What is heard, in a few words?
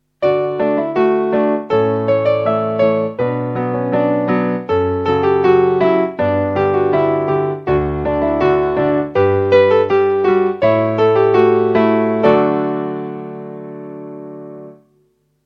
canon, piano